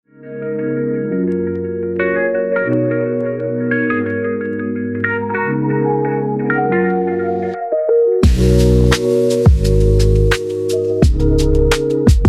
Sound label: lo-fi hip hop lo-fi beats